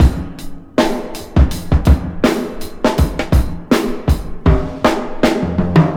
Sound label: 80 bpm 00s breakbeat A sharp key; drum loops